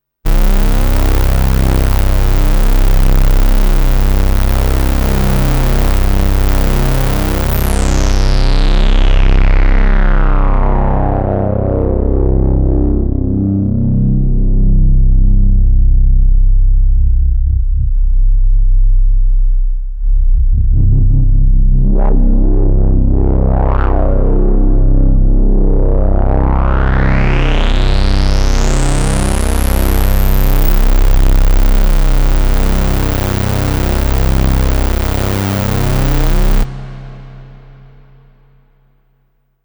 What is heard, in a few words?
Pulse drone 4 poles LPF; uno synth pro desktop